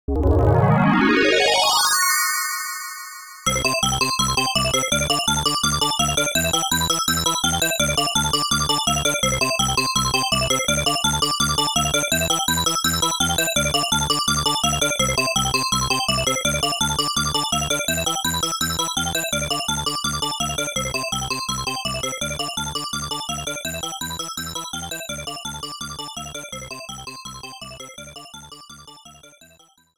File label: Bonus Game